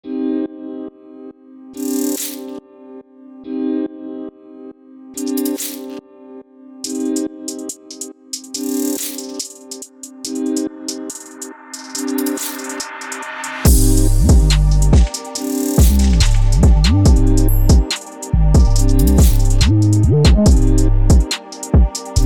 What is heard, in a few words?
808 Drill Loop Beat